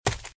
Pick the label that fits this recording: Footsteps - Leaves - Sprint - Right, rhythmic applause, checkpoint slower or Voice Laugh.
Footsteps - Leaves - Sprint - Right